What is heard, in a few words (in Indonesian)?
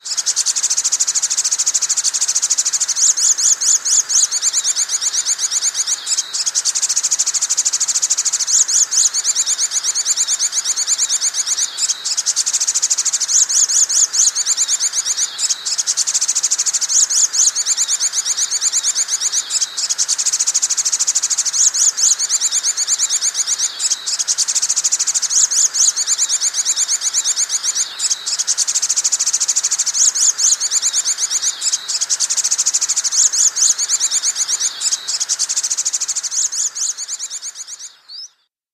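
kolibri sriganti, sunbird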